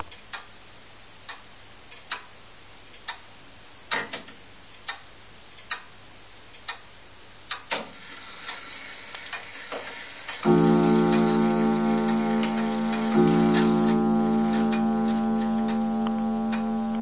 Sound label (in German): Rundgong Schlag; 2Uhr